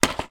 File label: household medicine tablets pack foil box down on table; zapsplat